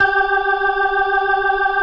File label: G4, PERCORG; Sonic Reality; Vintage Timetraveler